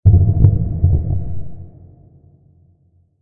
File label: giggle